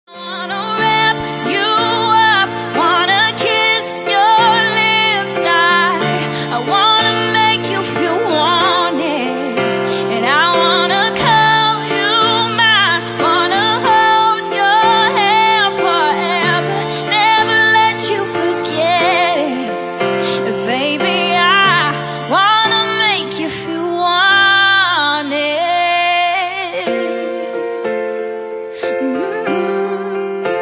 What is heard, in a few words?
acoustic